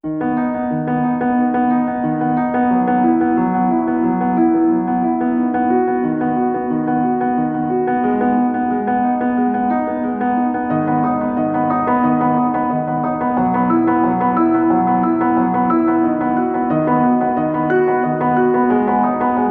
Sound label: fast arp; LANDR